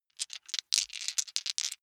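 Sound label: big three rattle in hand, wuerfel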